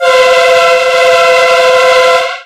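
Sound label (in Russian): gudok vagona metro, metro car horn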